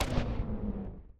wreck Fall; explosions; Mindustry

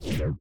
sap; Mindustry

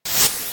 respawn; Mindustry

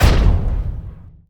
explosion Crawler; explosions; Mindustry

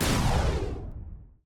shoot Missile Long; Mindustry

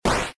flame; Mindustry

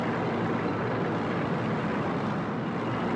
tank Move Small; movement; Mindustry

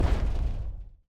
block Explode Alt; Mindustry; explosions

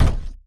block Place; Mindustry

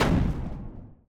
artillery; Mindustry

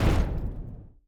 block Explode Wall; Mindustry; explosions

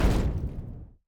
block Explode; Mindustry; explosions

